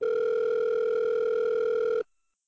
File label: ringing